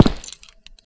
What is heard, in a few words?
player step; xash3d fwgs